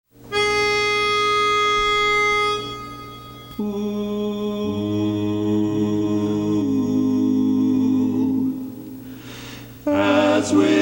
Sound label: tenor; as with gladness men of old